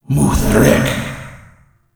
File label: Alt Fire Whispers; Weapons; Warframe; Tenno; Grimoire